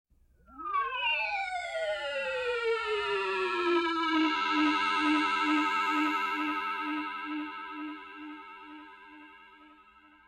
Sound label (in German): ufos vorüberfliegende weltraumsonde; Science Fiction